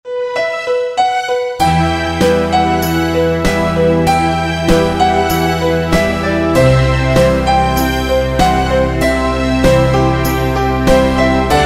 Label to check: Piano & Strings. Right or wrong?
Right